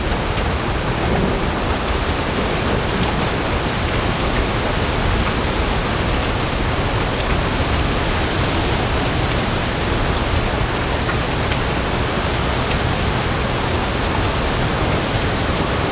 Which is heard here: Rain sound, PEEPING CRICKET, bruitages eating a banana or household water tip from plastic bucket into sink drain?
Rain sound